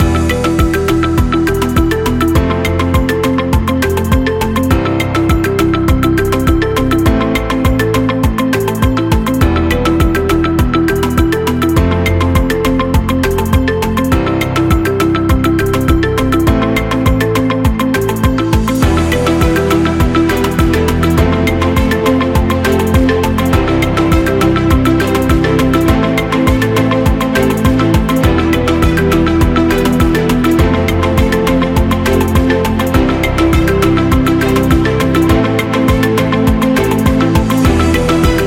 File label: Looping